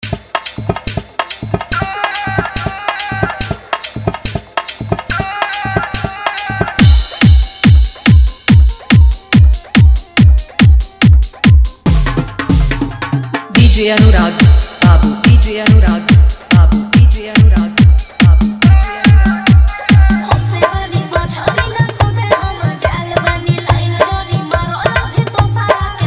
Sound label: New Bhojpuri Dj Song Dhollki Bass Mix